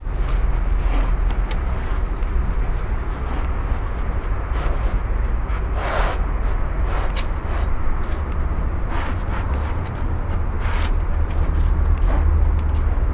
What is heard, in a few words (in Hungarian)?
intenzíven szimatolnak, foltos hiéna